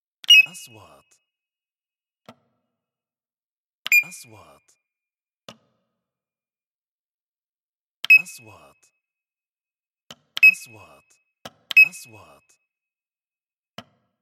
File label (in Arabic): نغمة كاشير, transitions